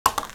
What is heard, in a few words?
Single Roll; hyperspace-roll